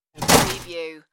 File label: Ice Machine Dig Into Glass; throw